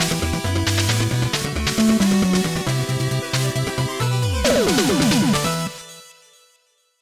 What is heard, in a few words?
Forest Sting